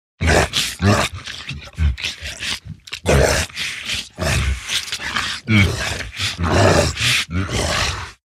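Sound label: orc greedily eats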